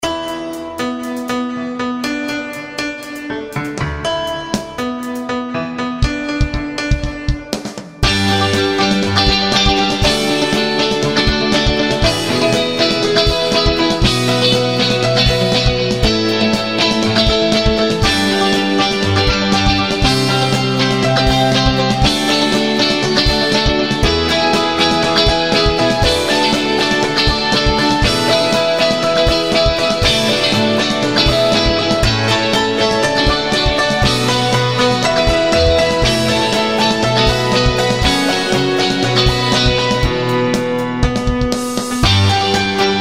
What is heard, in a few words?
Piano Waves